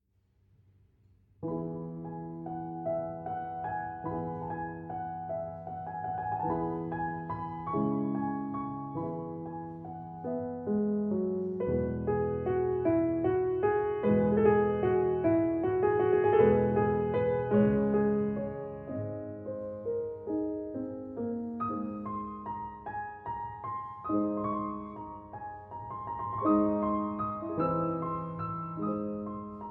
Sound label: Peer Gynt Suite No. 1, Op. 46 I. Morning Mood (Arr. For 2 Pianos)